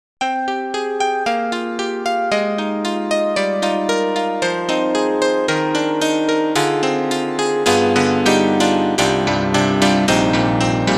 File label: Classical Piano; SD-90; EDIROL; Piano 3